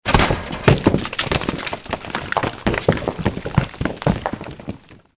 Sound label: stones; Soldier Of Fortune II Double Helix; XBOX